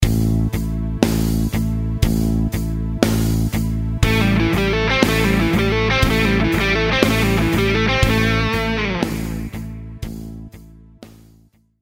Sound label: Lick 60bpm